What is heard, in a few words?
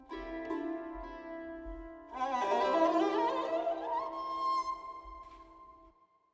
String Sarangi Free Time C Wet